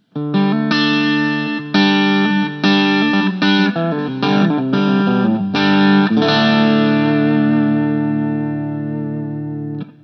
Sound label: Mooer SD30i AC 30